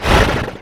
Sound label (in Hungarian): fujtatas; The Wild Thornberrys Movie